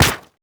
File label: paper hit; projectiles; bullethit; Max Payne